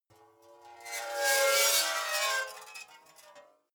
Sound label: metal scrape rich sequence short